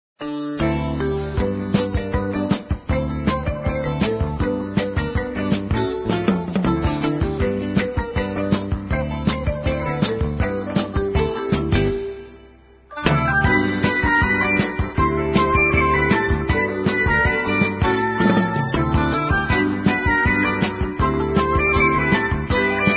Muzak; Zomboid; Lifestyle

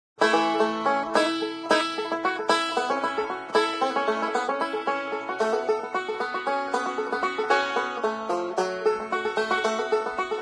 Banjo; Zomboid; Lifestyle

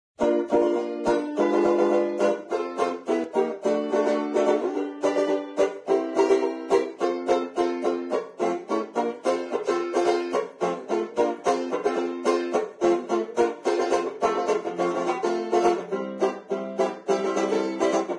Banjo; Zomboid; Lifestyle

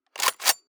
Shotgun Cock; Firearms; Zomboid

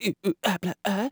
Man Dance Happy; Zomboid; Lifestyle

